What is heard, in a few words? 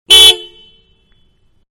Car Small-Horn-Short, Exterior; Vehicles; Car Sound Effects; Manhattan Production Music